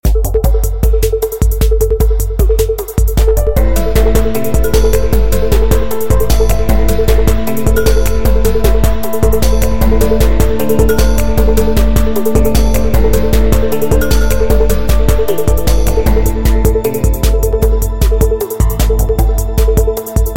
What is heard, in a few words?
fight loop; space merchants; Playito games